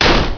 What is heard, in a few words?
shoot